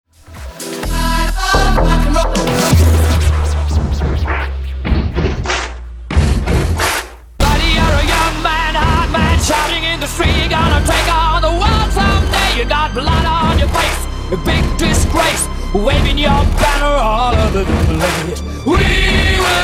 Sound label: Rock N Roll